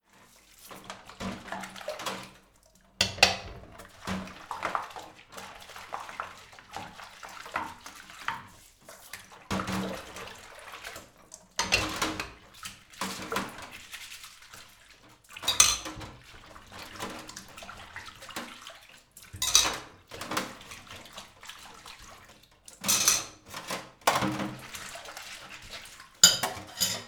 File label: Washing Dishes